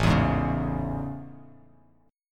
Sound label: A Major 7th A; AM7; strummed; JGuitar